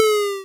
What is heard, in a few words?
hurt; scrapeboard